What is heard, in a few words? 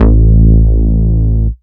Bass Root A